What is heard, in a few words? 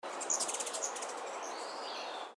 ruby throated hummingbird; calls; Audubon